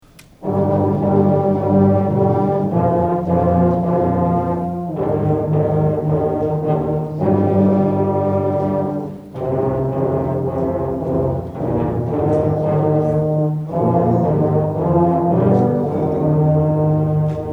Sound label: Low Brass